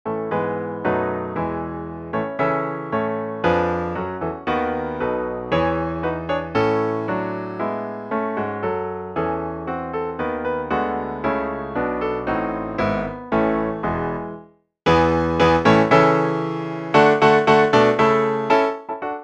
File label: japanese traditional; dokkoisho